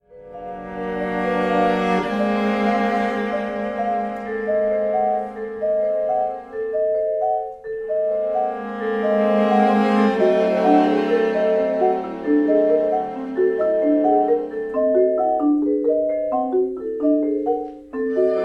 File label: Kvintett Perc